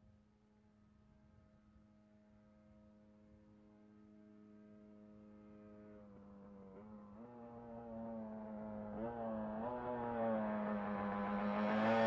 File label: Motorcycle approaching, turning off